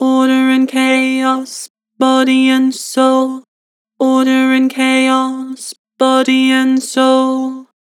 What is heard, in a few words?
Vocals Flat Order and chaos; Harmor